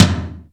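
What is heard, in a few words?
Roland R-8 hollow tom; Power Drums U.S.A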